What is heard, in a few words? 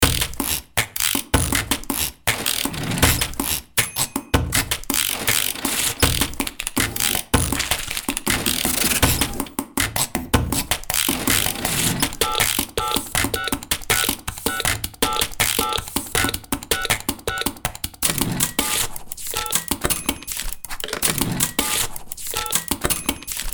foley office sound fx and rhythms; soundtrack loops foley v4